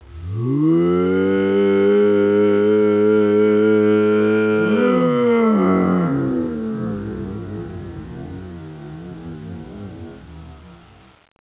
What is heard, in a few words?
earrape